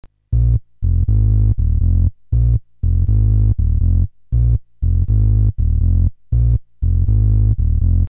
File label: bass analog, HardSID